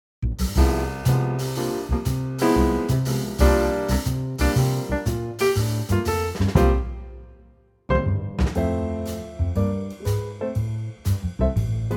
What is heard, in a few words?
F trio